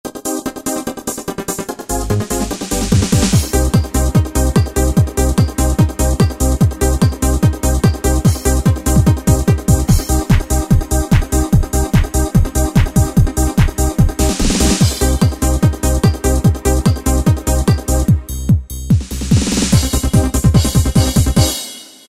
Hypnotic, Yamaha DJX Styles

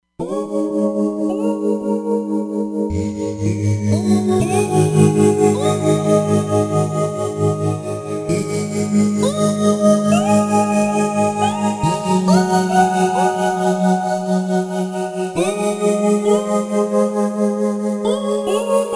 Nu Pad, Roland Fantom XR

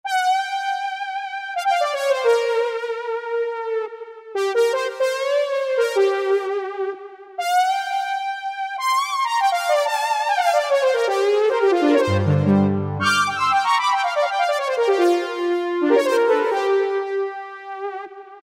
Under Heim, Synth & Fx; Factory Voices

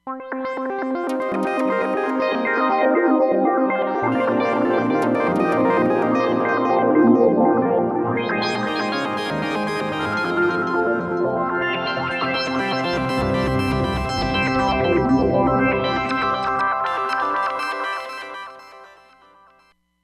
Generator, Roland Fantom XR

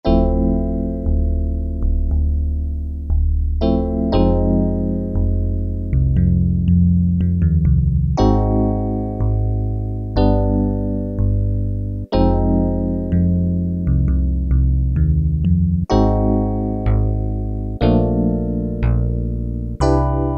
BASS EP, Casio VZ-10M; Internal factory combi

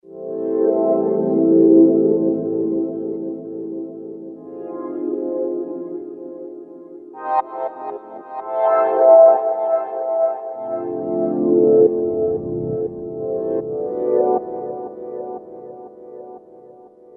Cosmic Echo

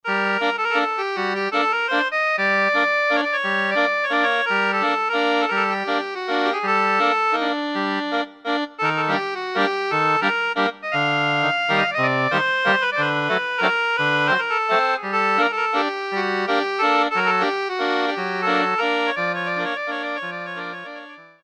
Reed Organ, Roland JV-2080

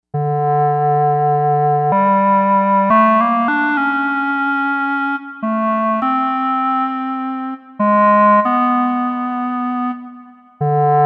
Woody Wind, Novation UltraNova; Factory Patches

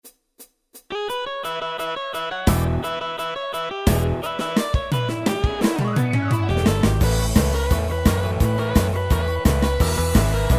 Rock & Roll, R & B; Yamaha PSR-3000; Factory Styles

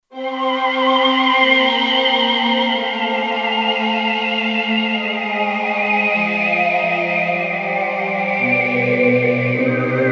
The Cymbal Sings, Kurzweil K2500X; Factory Programs

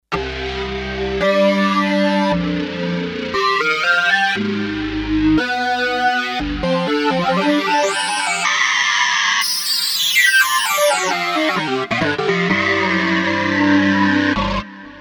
Kawai K5000S

